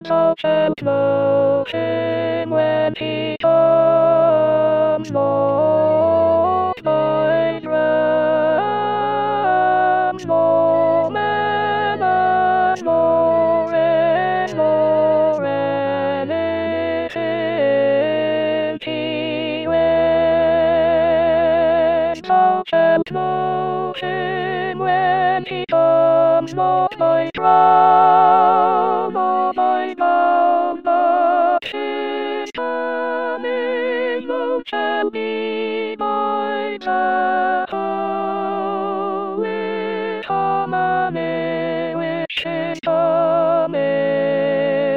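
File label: alto 2; Sirett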